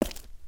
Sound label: Footstep Stone Outside; creatures pets